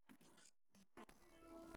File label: piano